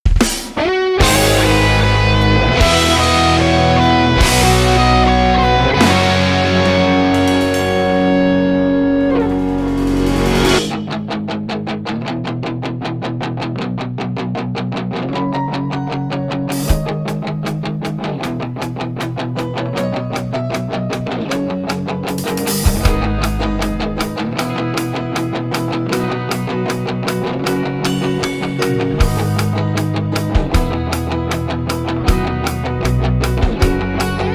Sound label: Full Karaoke; Pony Music Archive